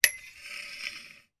foley clothes hanger hang on metal rail then slide external freestanding